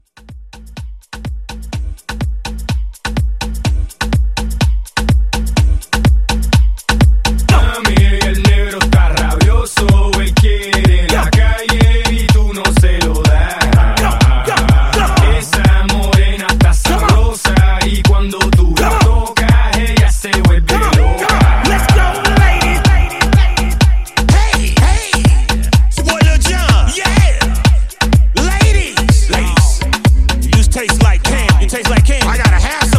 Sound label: DJ Intro Outro Club Hype 125